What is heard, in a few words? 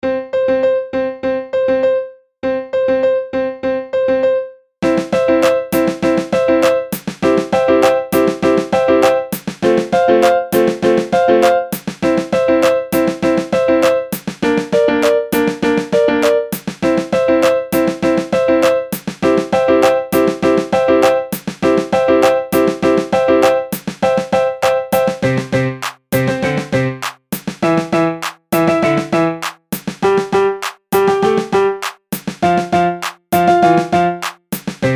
Super Cheesy Piano Chord; Fun QX 7 Turbo Special Edition